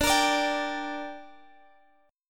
D Suspended 4th Sharp 5th D, down; strummed

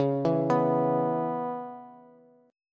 C sharp Minor 7th C sharp Bass; C#m7; arpeggiated; JGuitar

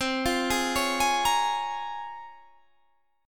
Bb Minor 7th C; Bbm7/C arpeggiated

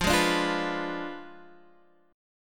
F Diminished 7th F down Guitar Half Step Down

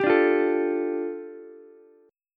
G 7th Suspended 2nd, Ukulele; strummed; JGuitar

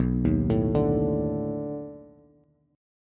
B 7th Sharp 9th B down Bass; B7#9 arpeggiated; chord shape; JGuitar

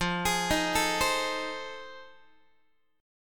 F 7th Sharp 9th, Guitar; F7#9 arpeggiated; x,13,11,13,13,11

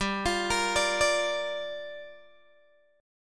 G Minor 7th, Guitar, Open G

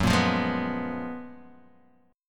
F sharp Major 11th Guitar DADGAD

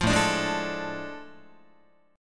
A Major 7th Suspended 4th Sharp 5th, Guitar; strummed

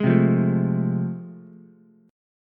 A Minor Major 7th Sharp 5th, Ukulele; strummed; AmM7#5; JGuitar

